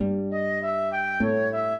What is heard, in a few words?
minuet, flute harp; Mozart